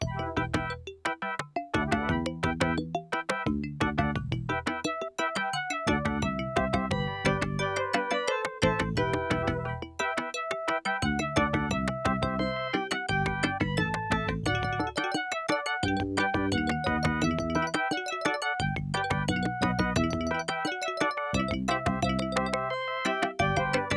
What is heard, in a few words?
ESS ES688; Monkey Island Intro